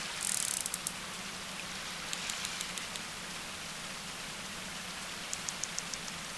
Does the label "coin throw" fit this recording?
No